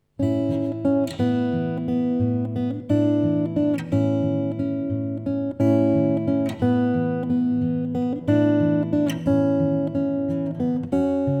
takamine dmp 56cbl

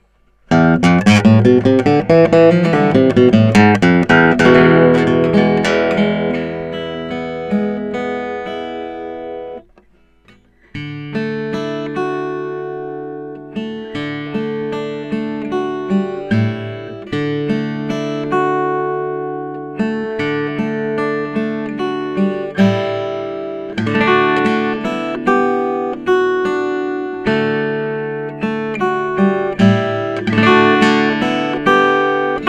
yamaha, guitar

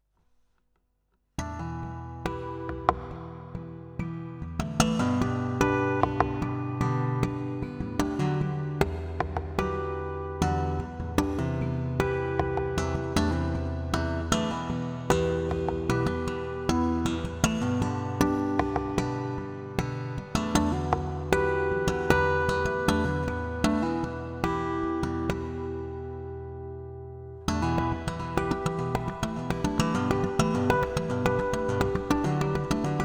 Gibson, guitar

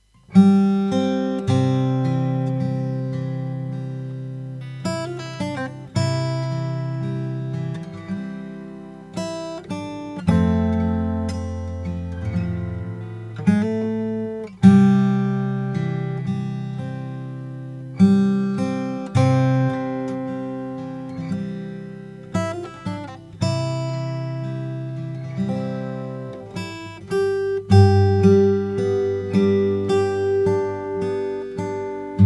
yamaha l31, guitar

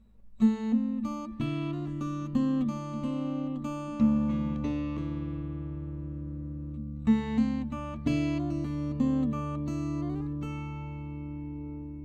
yamaha, guitar

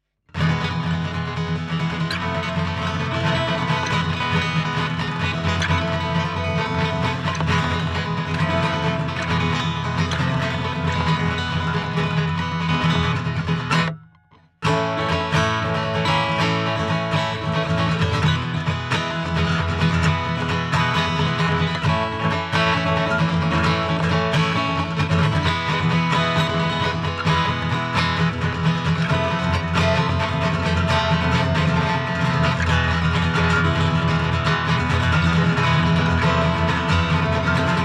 gibson, guitar

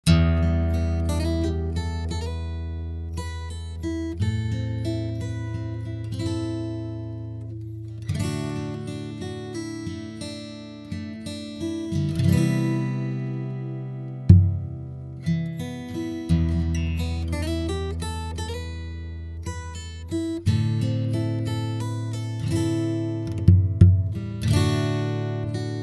piezo condenser

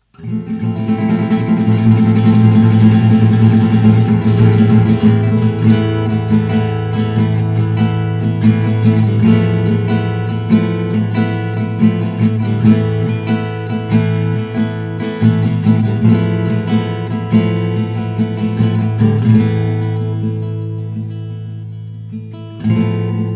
gibson l00, guitar

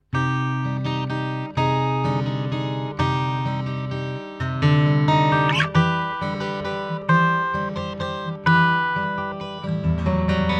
gibson, guitar

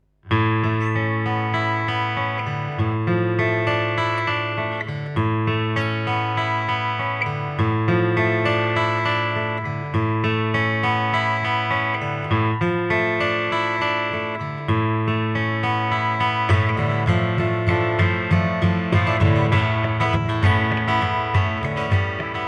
ibanez aw 60, guitar

